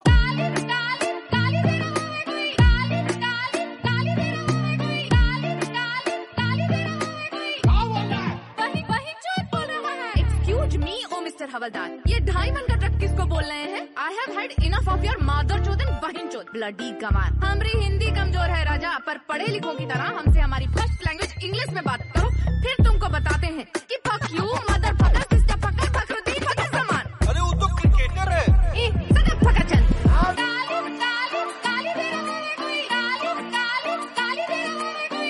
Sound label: song hindi